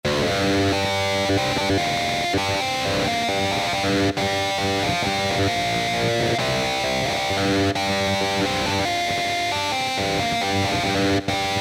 MID-BOOST, Roland SC-880; Factory Patches

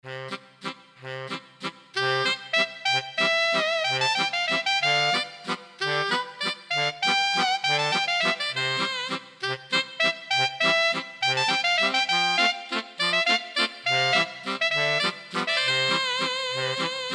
Liscio Sax; Generalmusic Equinox

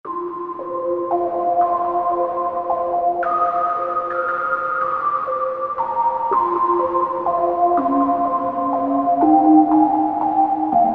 Ice Field, Korg MS2000; Factory Patches

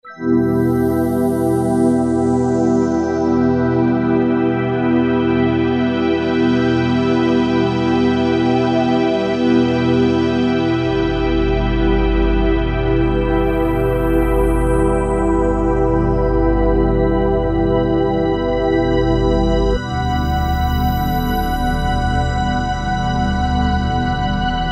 E-mu Morpheus, Chaos

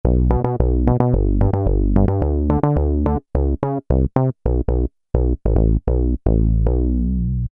House Bass, Kurzweil K2000; Kurzweil K2000 Value Pack; Extra Programs

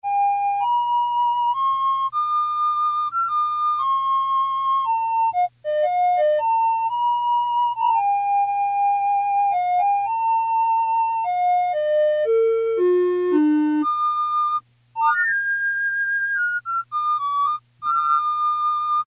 flute, Casio MT-500; Tones

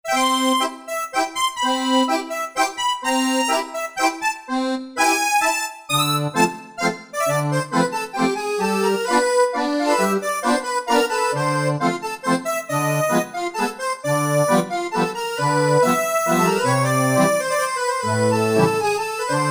Musette, Korg MSC-9S Organ

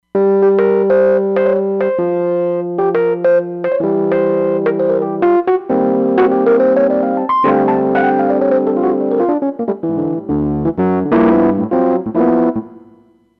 bumpy EP

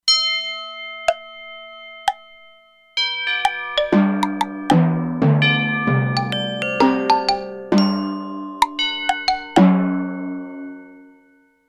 Percussion, E-mu Proteus-2; Factory Presets

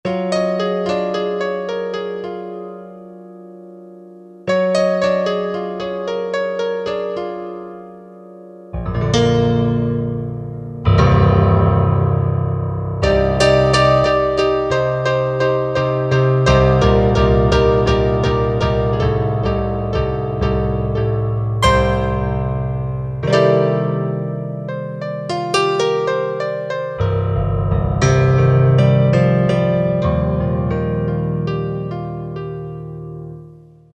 Piano Mix, Korg MSC-05S Piano

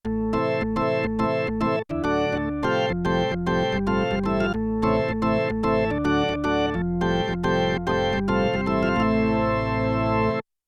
E Organ 2, Yamaha TX16W; Combo Performance 2

